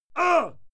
male fight yell short

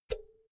power cord pull out quick; objekte; elektronik

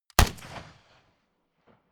rifle ak-47 exterior fire single clean; comedy cartoon; pistolen gewehre; Pistols and guns

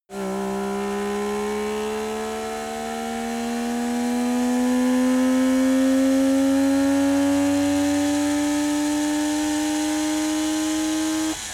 car saab 92 accelerate short engine

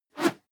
whoosh whistle fast; swooshes-swishes

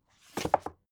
book shelve hard

